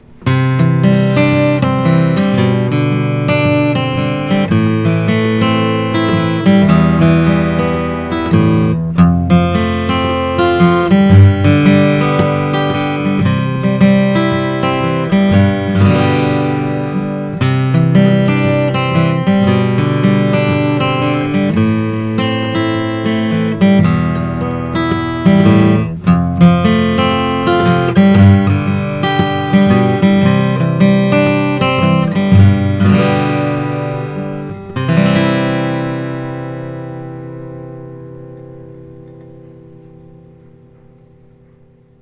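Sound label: martin custom 0000 m 12 fret aging toner, guitars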